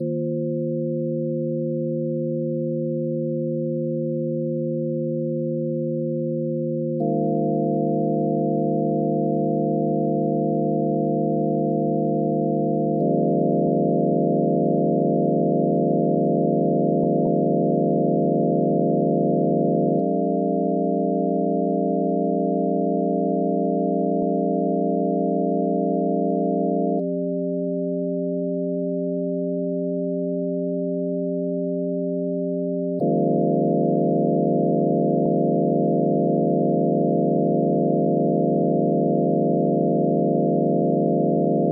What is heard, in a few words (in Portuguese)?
acorde